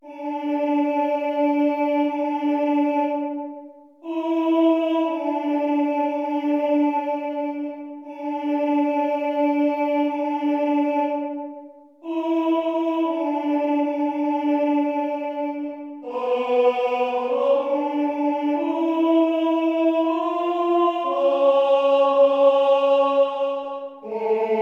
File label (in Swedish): sopran; Valborg